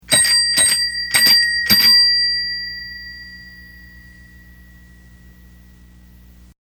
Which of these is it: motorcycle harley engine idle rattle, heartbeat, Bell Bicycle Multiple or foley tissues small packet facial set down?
Bell Bicycle Multiple